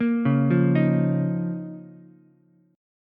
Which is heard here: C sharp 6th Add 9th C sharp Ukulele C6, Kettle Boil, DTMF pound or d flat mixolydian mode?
C sharp 6th Add 9th C sharp Ukulele C6